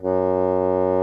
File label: BASSOON F#2, REEDS; Greytsounds VOL-1